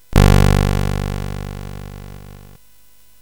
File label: Frantic, CSG8580R5